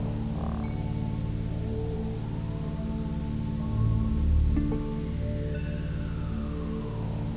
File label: song; compass2